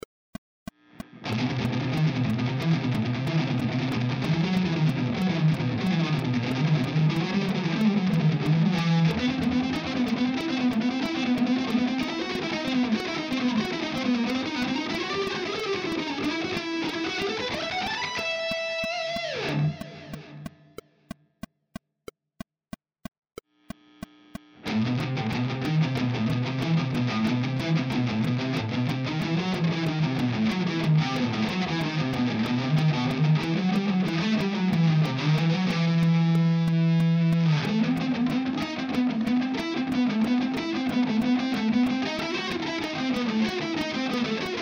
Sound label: picking exercises melodic phrases, guitar